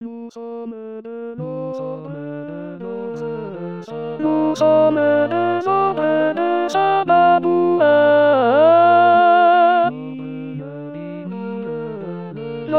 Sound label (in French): saint babouyn alto; 2019 st jean